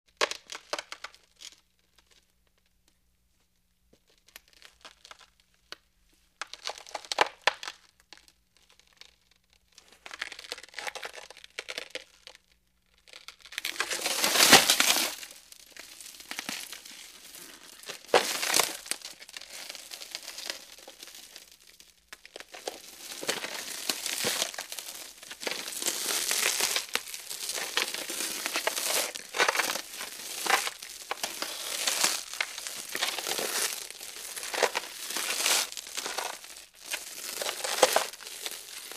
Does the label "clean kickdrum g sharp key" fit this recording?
No